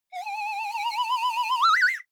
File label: whistle slide tremolo; bewegung; comedy-cartoon; soundpool